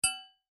impacts metal hit thin ping

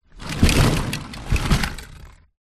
leisure toy cars in cardboard box movement

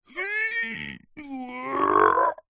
human male man strain grunt

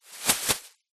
foley plastic bag very thin item drop in; zapsplat

